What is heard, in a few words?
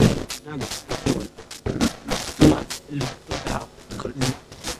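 rhythm loops, 100 bpm; Rarefaction - Poke in The Ear With A Sharp Stick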